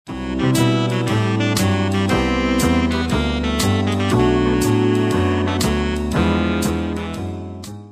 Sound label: swing